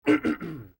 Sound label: clearing throat